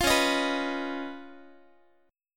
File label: Db 9th Db down Guitar; JGuitar